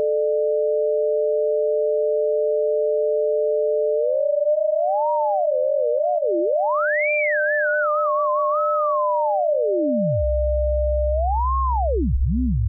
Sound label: test tone chaos